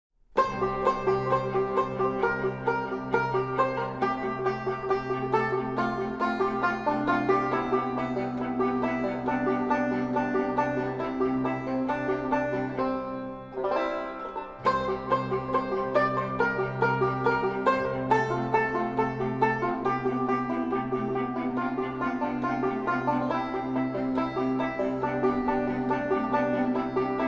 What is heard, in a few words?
concerto banjo